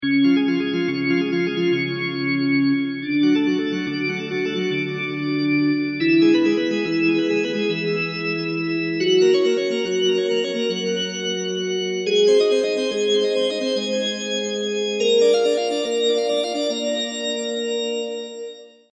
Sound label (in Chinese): 风琴上行合集; 战中; 战斗音效; nba